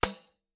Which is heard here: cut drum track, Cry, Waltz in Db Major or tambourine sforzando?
tambourine sforzando